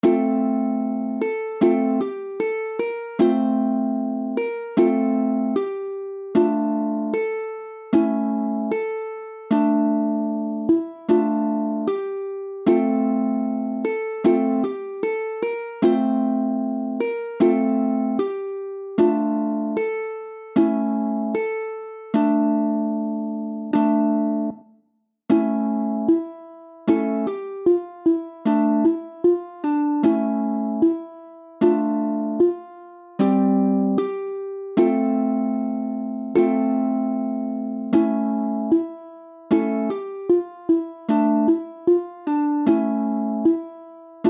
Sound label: National Anthem of Ukraine Solo, ukulele